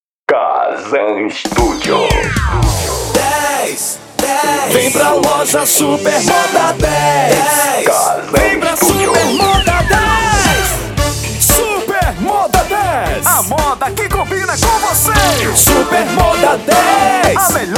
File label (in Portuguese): jingle